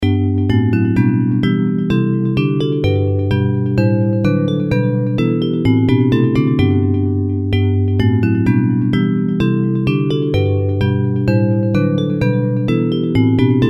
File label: Bells; Hymns